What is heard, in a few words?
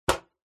impacts metal small thin hollow bash knock single; zapsplat